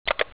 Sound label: click